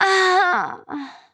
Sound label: Falling; paladins; Princess